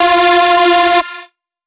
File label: Lead - F